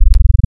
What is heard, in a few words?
Dual Sine; Synthetic's Serum Collection; Echo Sound Works - Modular